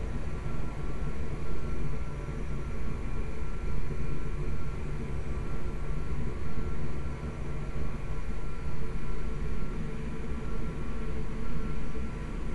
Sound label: int pod ambience; Titanfall 2